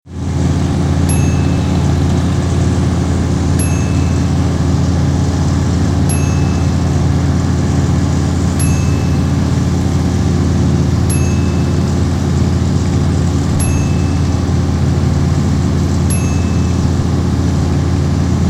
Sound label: Lawnmower Idling